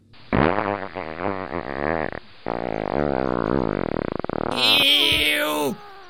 long farting; From "Pat & Mat" YTP; cartoon

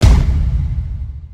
giant stomping

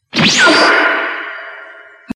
teleport; goku

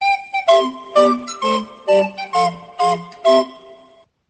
flutes

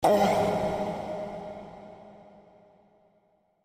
pufferfish eating carrot reverb

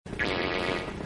character horns; mario kart 8

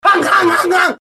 gun sound

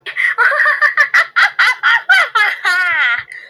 evil laugh; ice creams

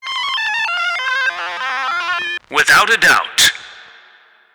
8 ball sfx

